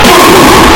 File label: boom